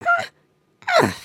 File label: indignant, emotes